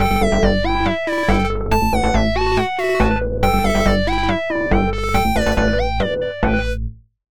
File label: ringtone; Android; Google; Android Open Source Project